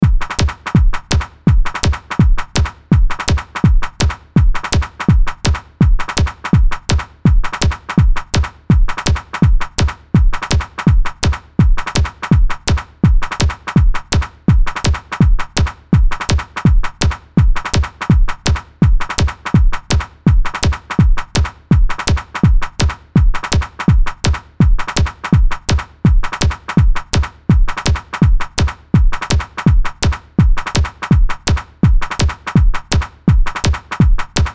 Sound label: Beat Loop Techno Tight Electronic Pop Up Tempo